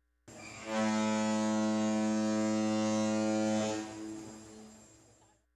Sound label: Ship Horn